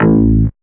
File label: BASS, Synth Bass, Roland